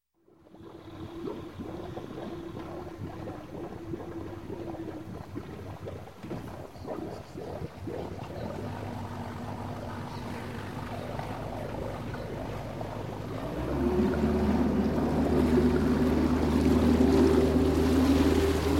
Boat Inboard - Idle And Pull Away, Marine; Sound Ideas; Series 2000

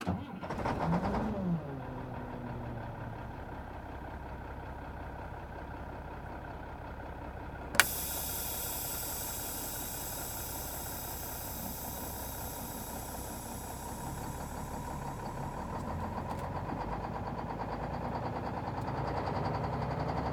Semi Truck; Large Vehicles; Blastwave

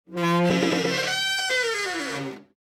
Door Creak; blindside

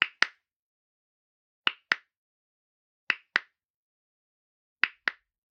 Generic Button Plastic Small; boom library; The Interface

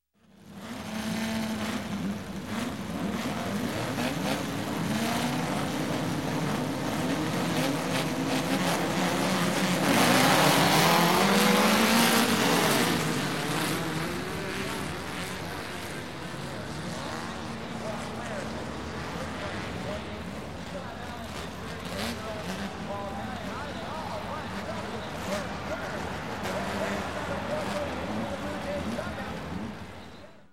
Dune Buggies, Race Rev Engines And Start Race; Crowd; Sound Ideas; Series 2000

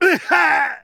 male pain hard; firefight

